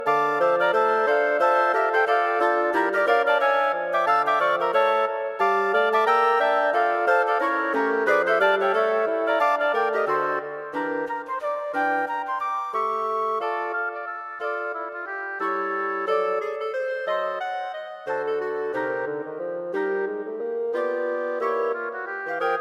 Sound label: Wind Quartet; Wind Quartet for Concert performance; A bit more than a jog!; A speedy piece for Wind Quartet - Flute, Oboe, Clarinet in Bb